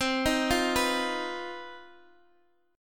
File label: C Minor Major 7th Double Flat 5th chord, Guitar Open D